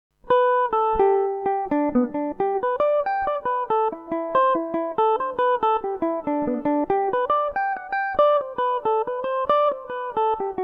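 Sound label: G major revelation, irish mandolin; The Thrush In The Bush; Revelation Jaguar electric mandolin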